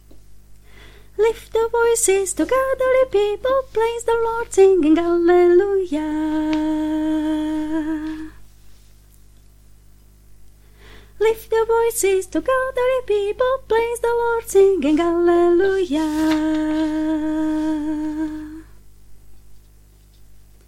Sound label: Bass; Farnosť Letanovce